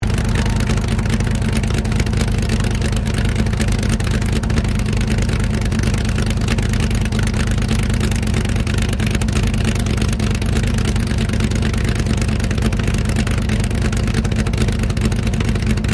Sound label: ENGINE CHEVY C5R 7LTR IDLE EXHUAST CLOSE, Army recruiting vehicle; Trucks; Vehicles